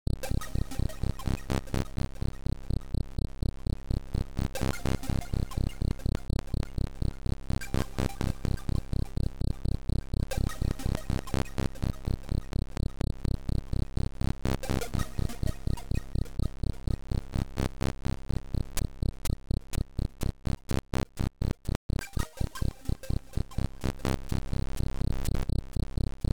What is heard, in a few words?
ambient; G&G: ambient; Guns & Gears